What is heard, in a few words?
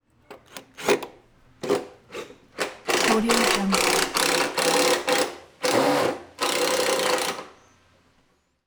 impact driver; collanderson